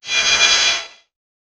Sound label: sad sound of a droid